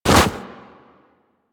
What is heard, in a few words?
Run Deep Snow, Footsteps; Warframe; Operator